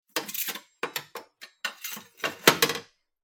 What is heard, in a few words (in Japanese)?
包丁をしまう音